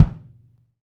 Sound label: KIK, Real Kiks; Club-50 - Foundations Roland